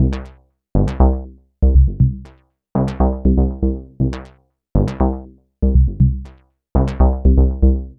Bassline, Prototechno

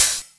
Open HH, Cymbals

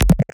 Lat, Kik, One Shots

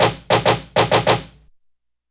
Bamboo Stack, percussion; Sonic Foundry (Sony Creative Software); Joe Vitale Latin Percussion

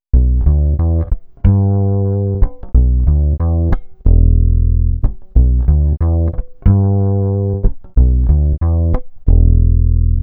Vintage Fretless, Electric Bass; Sonic Foundry (Sony Creative Software); Leo Cavallo Bass Taster

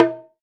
Tera, Drum Machines

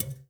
TIMBA, BR TIMBALES; Spectrasonics; Liquid Grooves 1 & 2

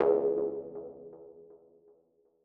Mono DBeat C#2; KG MegaSaur

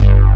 SAW BS, JV 1080; Pro-Rec - Synthsonic

